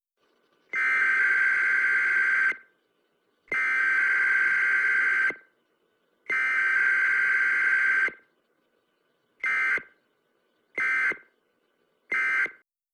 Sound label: Emergency Broadcast System Tone; Short